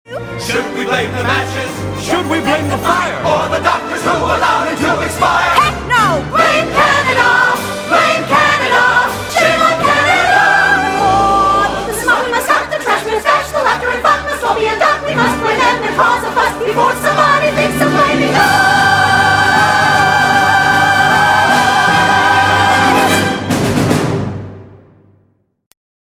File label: chorus